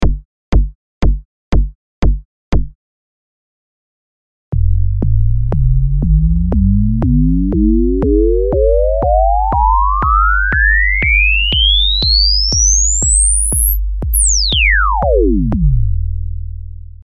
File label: AM Modulator; IK Multimedia Mixbox